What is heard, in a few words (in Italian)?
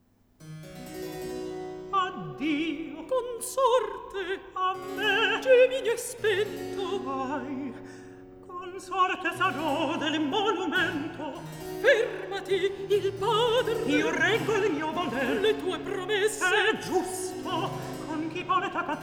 Recitativo; Atto 2 - Scena 17; Vitellia, Lucio; Addio; Antonio Vivaldi; Tito Manlio (2005)